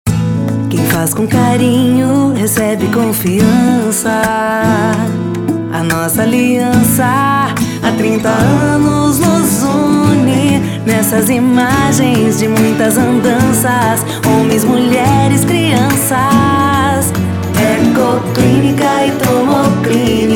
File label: Jingle; Head Produções